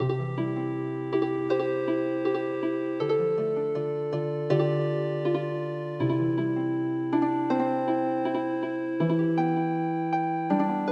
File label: Manshu Koku Kokka Healing Harp